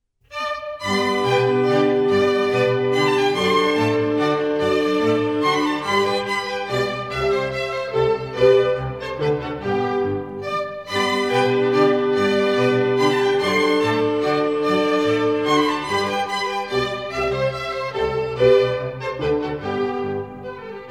Minuets, G Major; Mozart; Dances and Marches

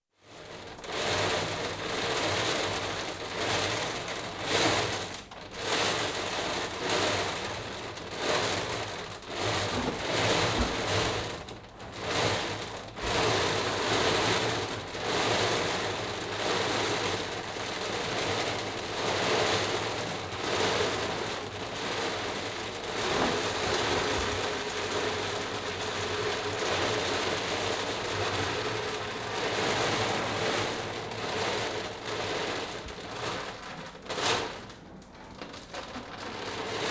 Cattle cake distributed into feed bins; Livestock; BBC Sound Effects Library

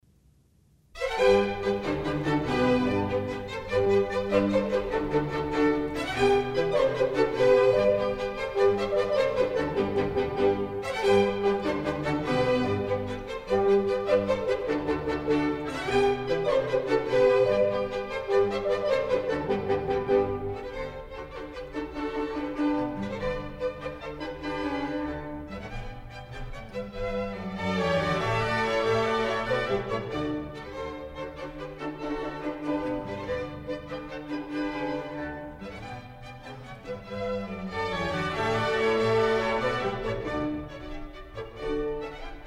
Contredanses, G Major; Mozart; Dances and Marches

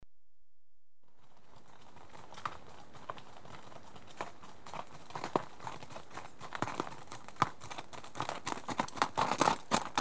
two horses at walk on gravelly track, l to r; BBC Sound Effects Library

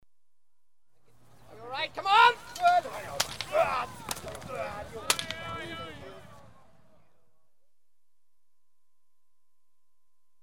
Racing - Start of race, Equestrian Events; BBC Sound Effects Library

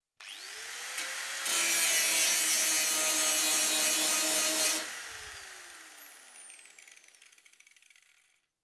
Electric Grinder, Short Burst On; Construction; BBC Sound Effects Library

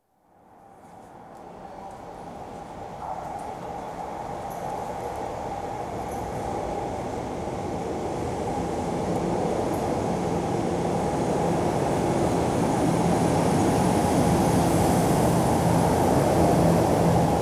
Diesel Trains Mainline express; The BBC Sound Effects Library

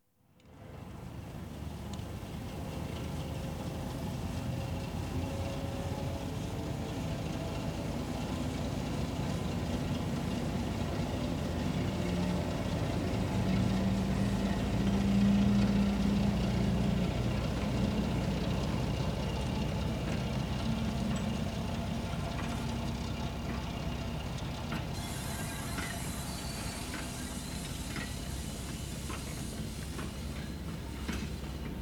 Diesel Trains Shunting trucks; The BBC Sound Effects Library

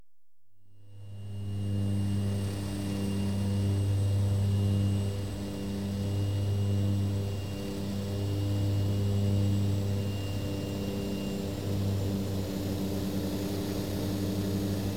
LAND, TAXI, SWITCH OFF, Aircraft; The BBC Sound Effects Library

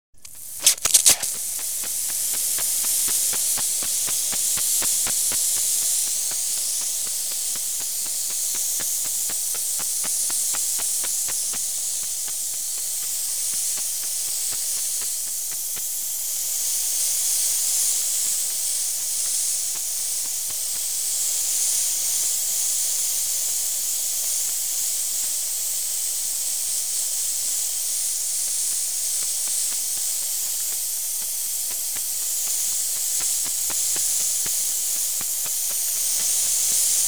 Hose pipe- start, stop, Water; The BBC Sound Effects Library

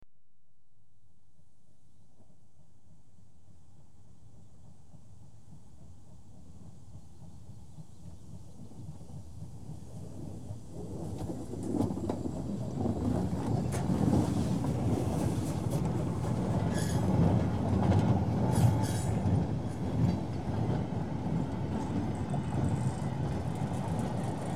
Goods Train Passes L-R; BBC Sound Effects Library; Age Of Steam

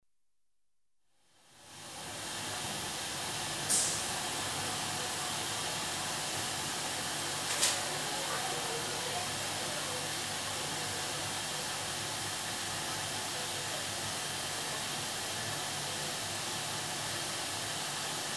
Autoclave sterilisers, Hospitals; BBC Sound Effects Library

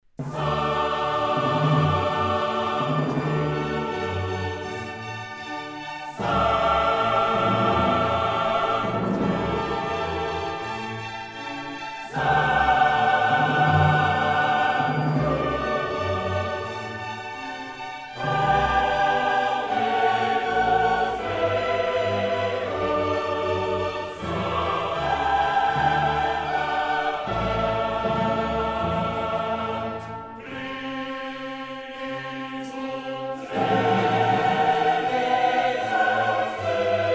Requiem in D min Sanctus; Mozart; Missae and Requiem; Davis, Gardiner

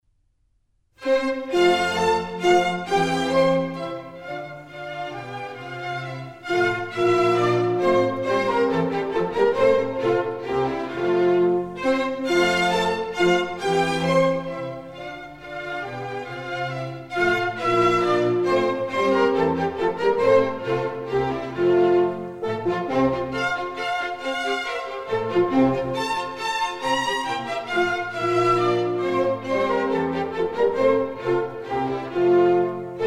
Minuets, F major; Mozart; Dances and Marches

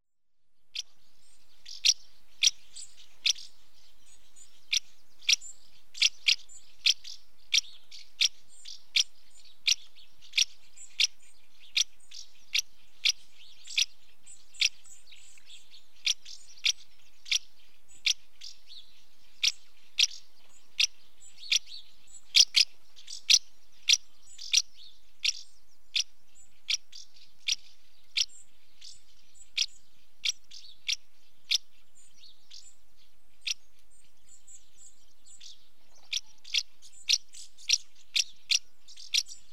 House Martin female calling at nest entrance; British Birds; The BBC Sound Effects Library

